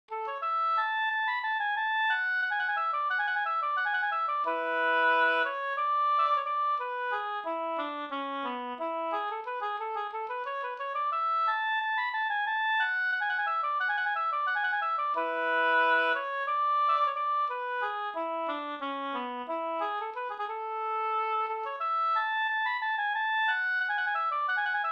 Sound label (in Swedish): ganglat; Trettondagsmarschen